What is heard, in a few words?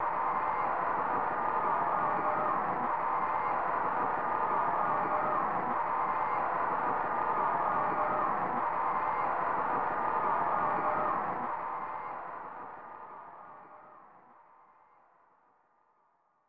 Applause; John Madden Football; snes